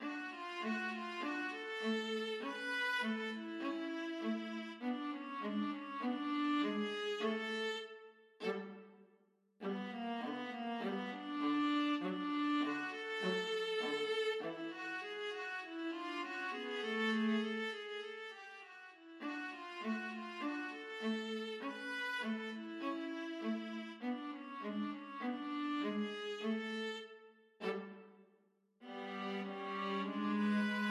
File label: VLA, viola duet; 24th st rag